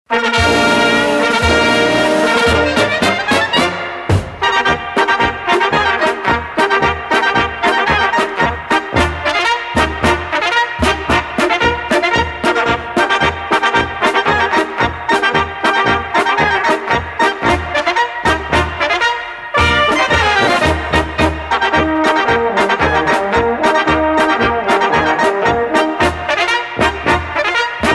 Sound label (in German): Starnberger Fischermarsch